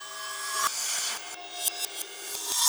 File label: Cymbol Particle; Particles